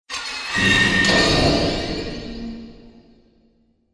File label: Horror